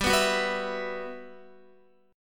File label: G Major 13th, Guitar; Listen to GM13 strummed